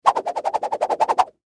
Whip Spin Twirl Whoosh Swoosh Rod Stick; Objects; Blastwave; Foley

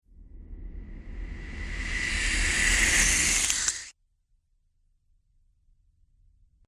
Zombie Monster Ghastly Breath; Zomby Sound Effects

